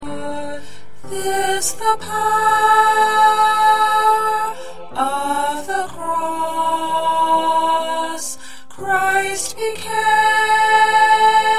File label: Soprano